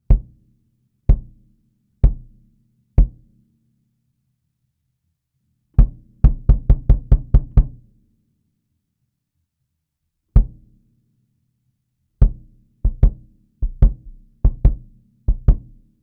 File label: subkick